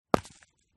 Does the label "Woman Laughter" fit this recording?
No